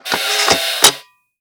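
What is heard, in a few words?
Bazooka Reload; Bazuka 3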